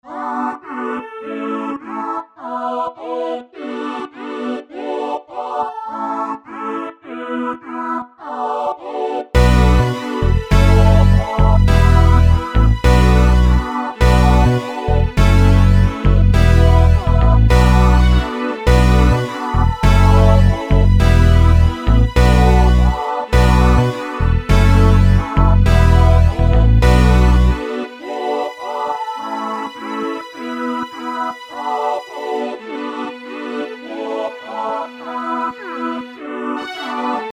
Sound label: All Parts 103 bpm